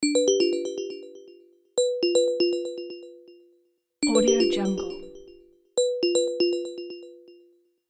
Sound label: incoming video chat